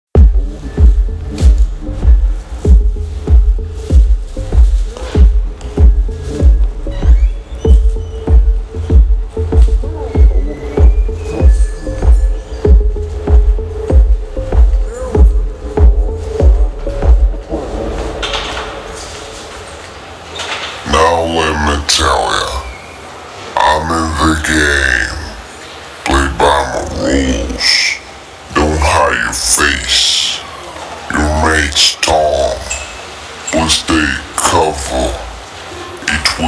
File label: Bass